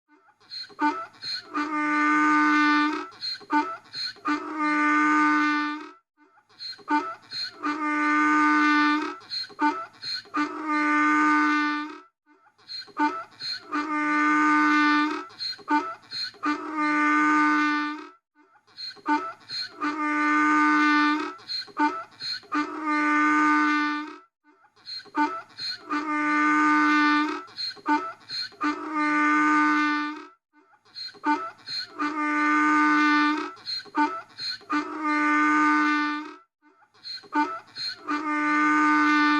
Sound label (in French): âne